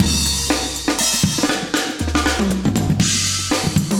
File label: 120bpm loops; Sound Effect Library